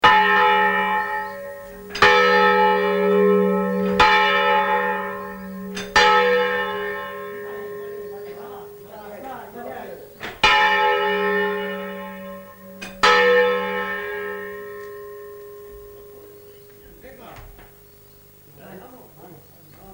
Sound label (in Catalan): campana